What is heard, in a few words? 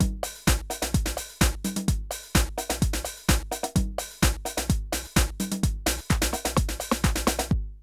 drum loops, House & Garage; Zero-G Groove Construction (1993)